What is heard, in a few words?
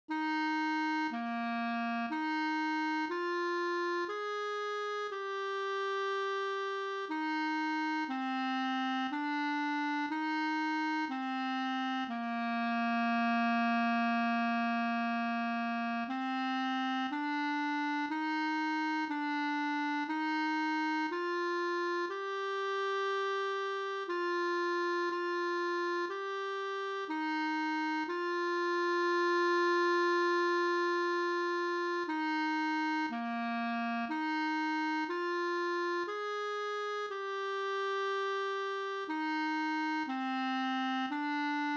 clarinet chorale and recessional